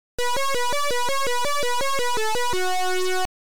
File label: Good Luck Beeper; Split Personalities